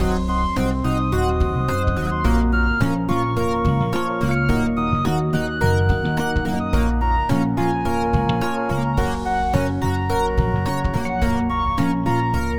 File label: dark path, Interactive, loopable music for some kind of platformer; baby steps adventure